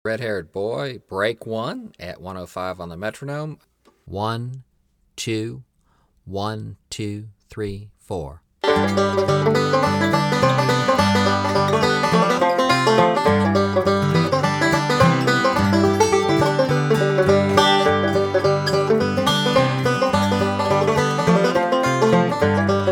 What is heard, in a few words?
Red Haired Boy Break 2, 105 Bpm